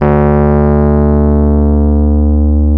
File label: D 3, Electric Pianos; Wizoo